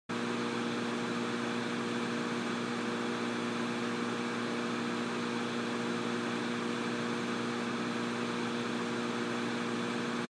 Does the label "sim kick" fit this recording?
No